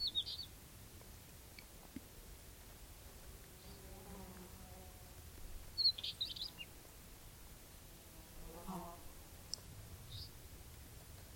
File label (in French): Traquet motteux; Allos